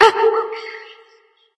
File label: grunt jump light; smite; voicepack; hel